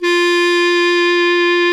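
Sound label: bass clarinet F5; Greytsounds; Scott Peers Sound Engineering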